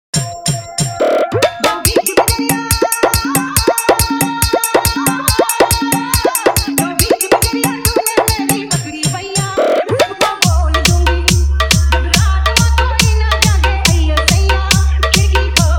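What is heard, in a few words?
Hindi Dj Remix Songs Edm High Quality Bass Mix; Jp baBu Kashipur deura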